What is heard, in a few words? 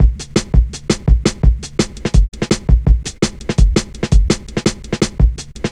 Drum Loops, Jungle